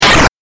8bit ダメージ

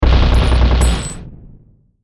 マシンガン

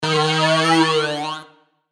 グラビティチューブ

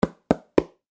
ドアノック

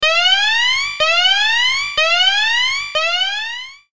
警報

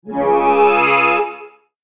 モンスター召喚

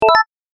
決定

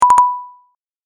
出題

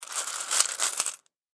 お金 ジャラジャラ

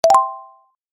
正解

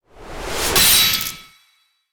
高速斬撃

